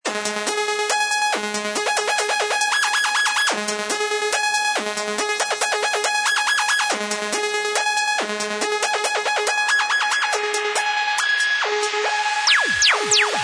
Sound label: basslines dubplate